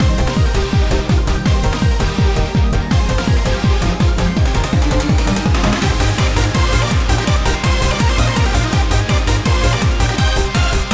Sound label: fever stadium bpm165